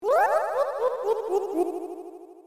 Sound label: laugh; kefka; ff3; ff3us